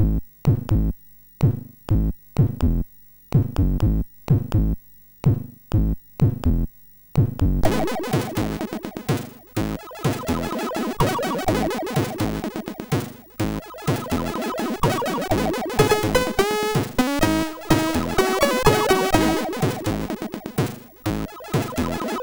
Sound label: sid, MOS6581; 90 Seconds; hvsc